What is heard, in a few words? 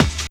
BD, Drum & Bass, 175 BPM; Ueberschall; Drum & Bass Flash Trackz